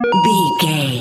coin collect low

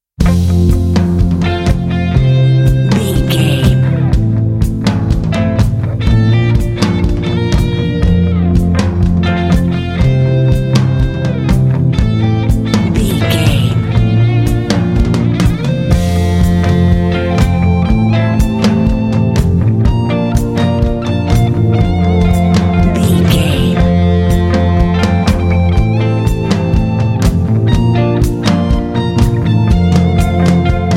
tender melancholy

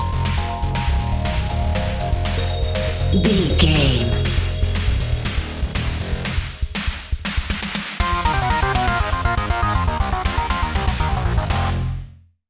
intense challenge video game bumper

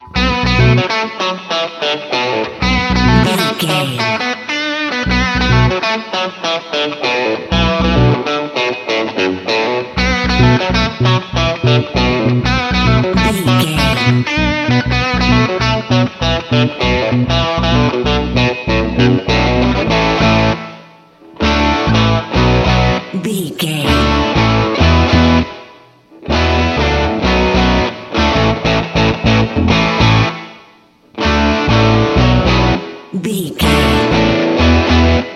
rock machine no drums

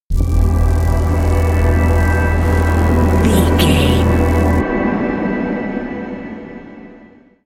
sting

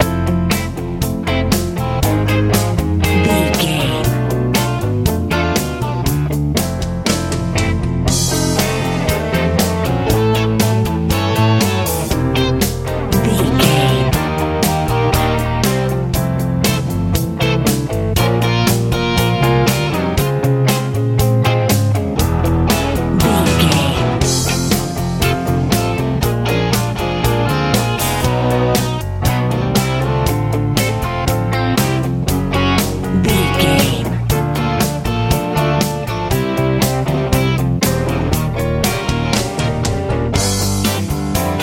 powerful warm echo head life driving country

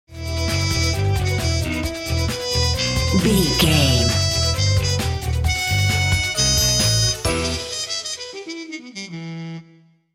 vintage spy theme stinger

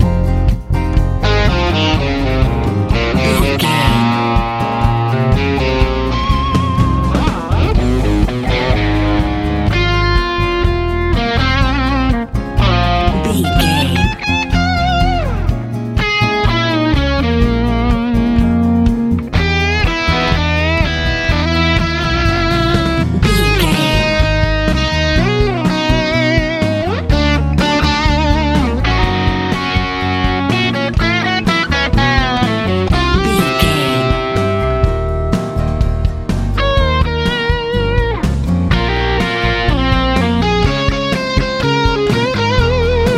colorful easy rock

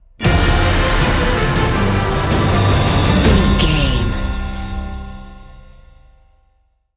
cinematic corporate sting bumper

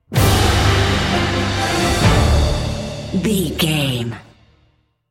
awakened epic cinematic sting bumper

